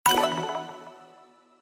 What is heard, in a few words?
menu charts click; Mrekk (2.0) «NM»